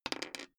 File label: die roll table